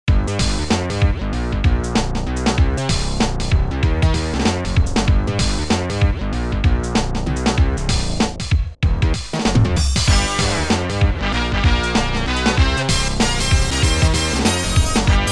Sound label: Menu Song; epic games 20th anniversary soundtrack; Jazz Jackrabbit